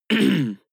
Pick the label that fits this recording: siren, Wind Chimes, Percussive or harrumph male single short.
harrumph male single short